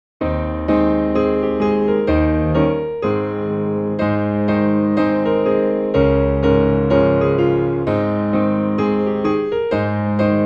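Traditional Piano Hymns Leaning On The Everlasting Arms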